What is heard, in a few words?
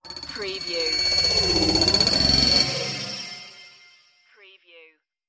alien bug flight